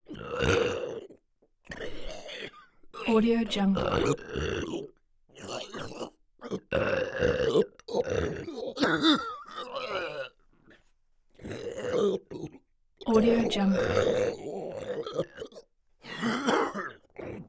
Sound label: vomit constant disgusting gagging vocals